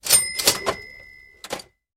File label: tip sound